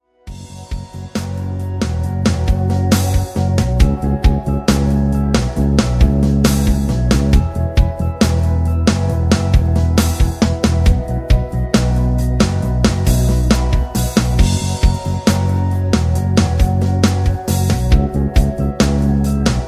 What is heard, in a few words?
rhythm